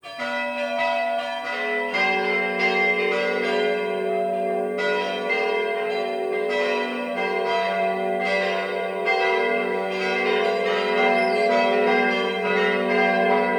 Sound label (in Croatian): ZVONA